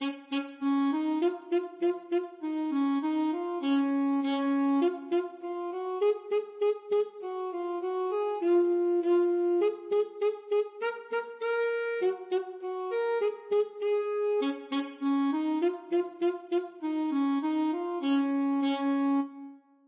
ASAX, saxophone

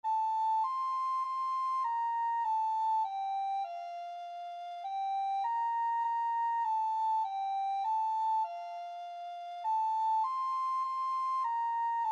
recorder

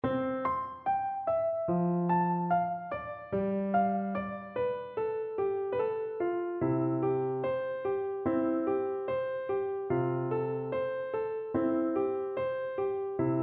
will ye no, Flute

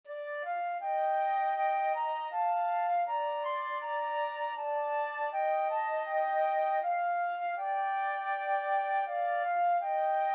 holst jupiter, flute duet

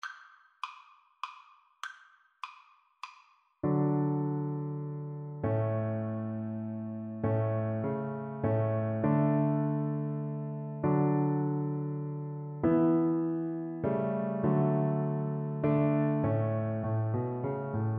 cello

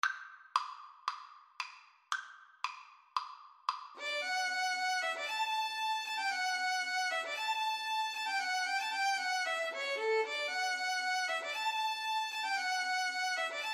spring longer violin duet

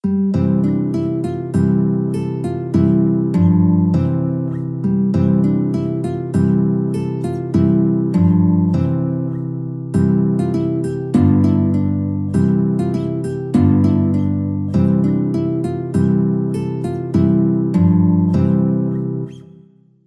guitar

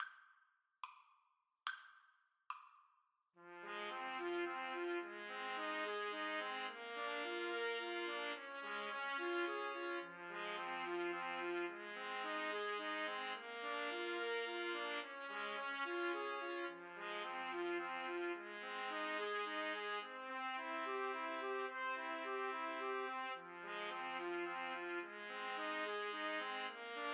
house of the rising sun trumpet trio

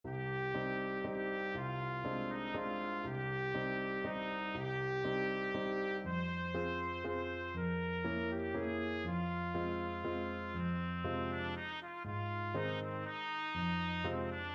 waltz trumpet; grieg

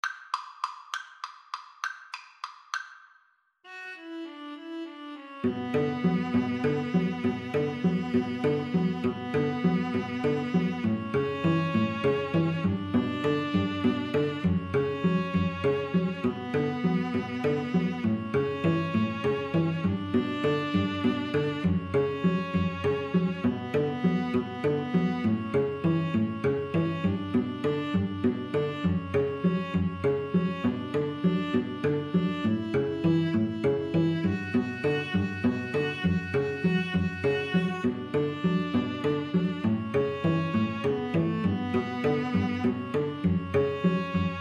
spanish violins trio

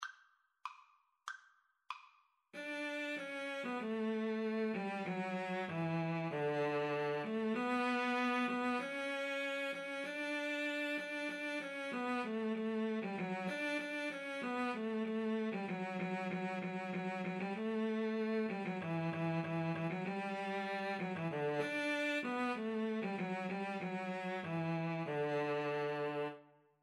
Cello Duet

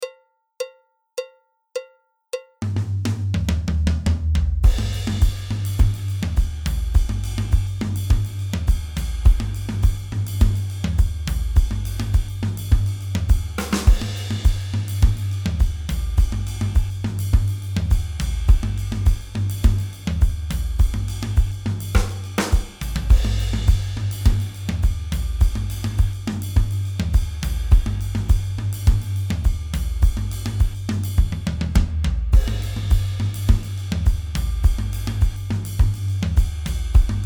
montuno beat, drums

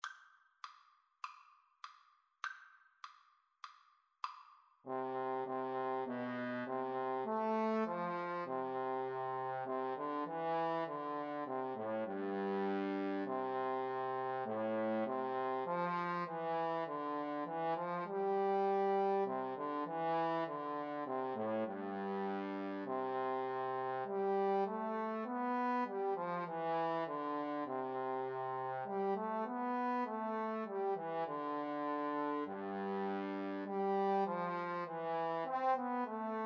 Trumpet Trombone Duet

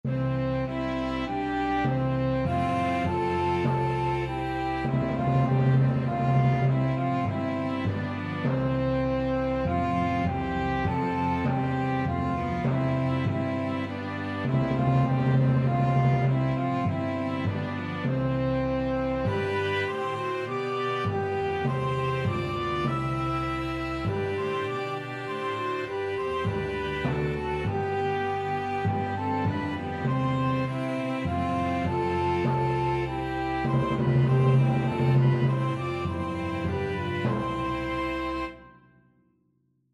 flexible mixed ensemble, 4 players

